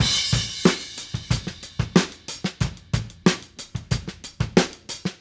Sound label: Call And Response Drums A Crash, 70s Analog Funk; Sonic Foundry (Sony Creative Software); What it Is!